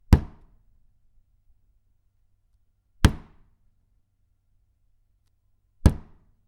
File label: Stamp